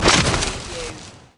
Skiing, Snowboarding, Landing after jump, snow slide